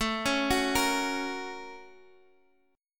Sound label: A sharp Minor Major 7th Sharp 5th A